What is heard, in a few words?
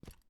jump